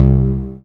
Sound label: GUITAR C2; Q Up Arts; Dream Experience